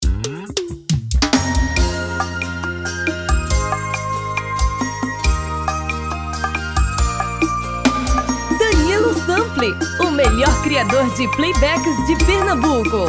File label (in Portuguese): SERESTA; tom feminino